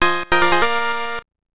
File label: Coin; The Tower of Druaga; TurboGrafx 16